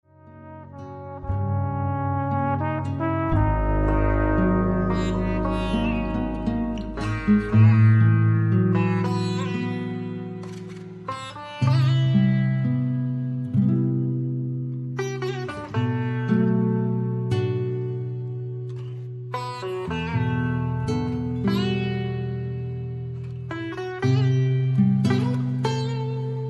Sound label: Sitar Solo